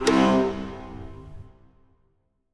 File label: music puzzle note up